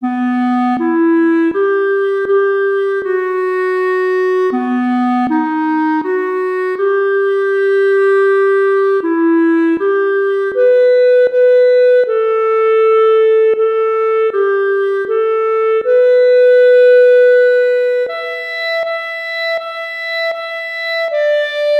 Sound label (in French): voix 2